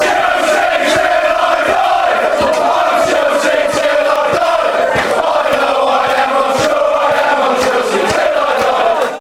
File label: fan chants; chelsea til i die